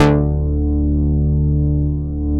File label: knife bass, Analog & Synth techno; USB Soundscan vol.12